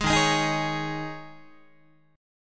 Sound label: Eb 7th Suspended 4th Guitar, Eb7sus4 chord; strummed; JGuitar